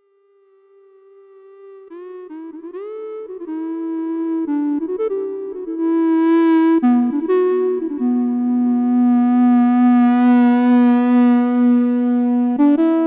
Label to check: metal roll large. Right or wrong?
Wrong